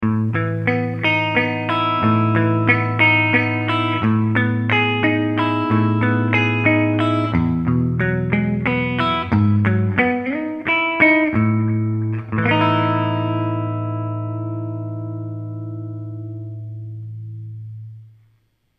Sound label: Electric Blade